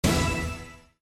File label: service special stop; Desert Tales